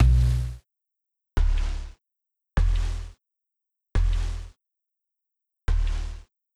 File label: gongs short examples